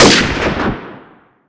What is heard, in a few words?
762mm sniper; weapons; ModernWarfare